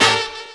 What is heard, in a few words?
HORNHIT, HORN STAB; E-Lab; X Static Goldmine 2; akai